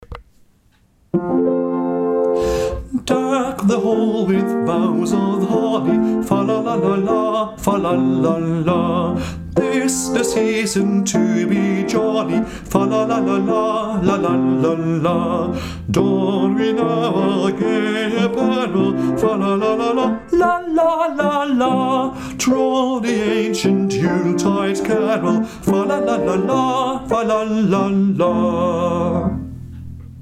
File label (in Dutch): Deck the hall sopraan